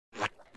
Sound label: walk noise; squidward